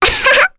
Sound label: Laugh, Vox; en; voices; heroes; Reina; kings raid